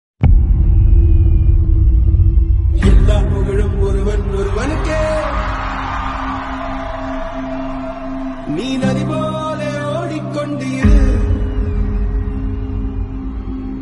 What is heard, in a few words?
Tamil Song